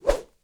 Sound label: Whoosh, High, Airy; Universal SFX